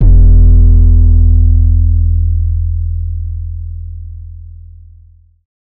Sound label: 808 one shot E; Slate Digital BASSHOLE Sample Pack